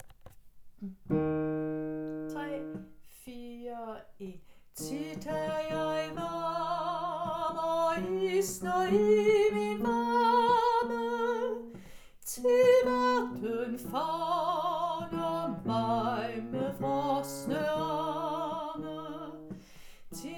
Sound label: BAS; Tit er jeg glad